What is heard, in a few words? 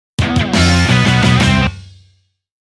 Get Jingle